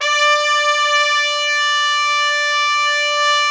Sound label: D5 TRUMPET; Northstar - New Gold VOL-1; ST.TRMPTS